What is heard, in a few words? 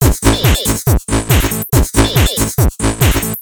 Tekkno